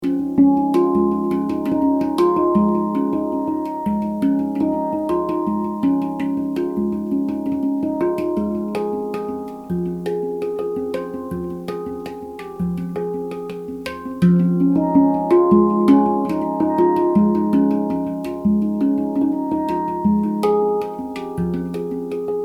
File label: HANDPAN SOLO